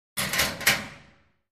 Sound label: Locker Room Metal Lo